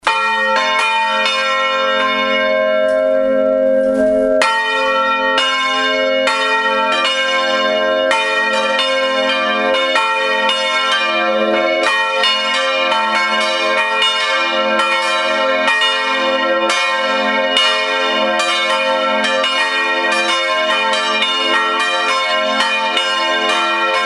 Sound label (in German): Glockengeläut; kirchen